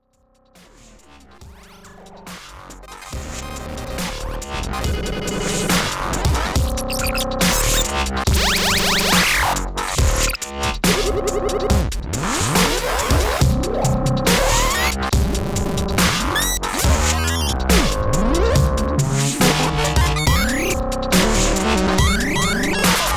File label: Arcadia 8 Bits Invasion; FUTURE LOOPS